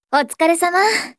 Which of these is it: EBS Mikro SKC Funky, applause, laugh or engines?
applause